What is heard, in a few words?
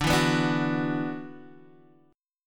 F sharp 7th Suspended 4th Sharp 5th D